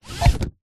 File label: vehicles car hyundai trajet seat belt pull fast; 2006